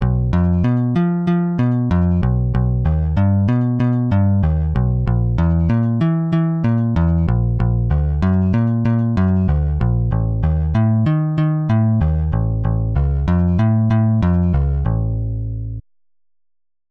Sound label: Electro Acoustic Bass; Bassesland; Syntheway